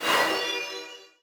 scroll buff; Idle Wizard

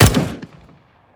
Weapons Sg556

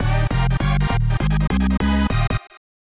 Level Complete; pac attack

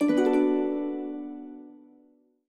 Itemget; aster tatariqus android ios mobile gamerip 2023

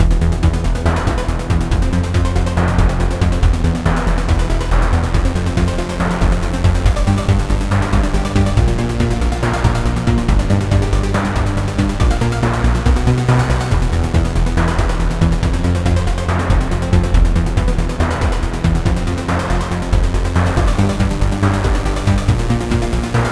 Battle Sequence

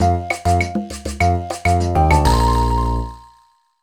Level Clear! Fanfare; mario vs. donkey kong 2 march of the minis; mario vs. donkey kong 2 minimini daikoushin; nintendo ds; 2nd Floor Fanfare

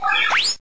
Horn Leech; pokemon reborn e19 2022

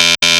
Beep Error

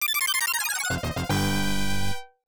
Point Game Start; yes no sinri tokimeki chart taito f2 system arcade

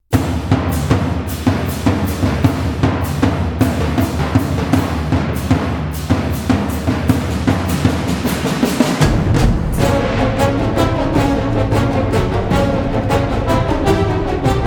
String; The Bureau XCOM Declassified

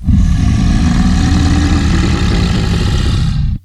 tiger monster whirr; Voices; Massive Cleavage vs. Zombies Awesome Edition

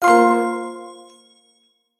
card match; escape from frankensteins tower

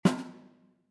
Drum Right; Puzzle20; Scarlet Hood and the Wicked Wood

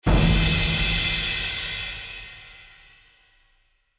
Swell; Warhawk; ps3

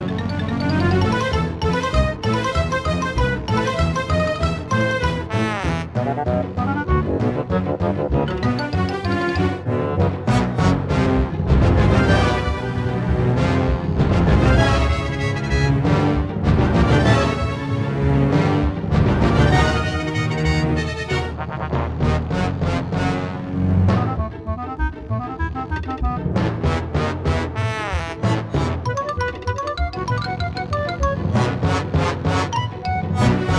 Boss Fight

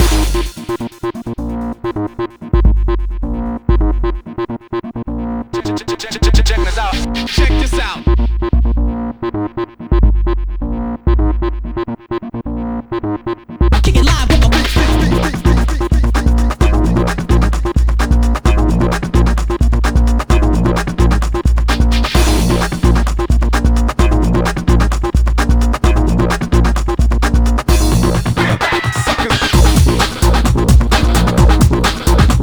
Pluck

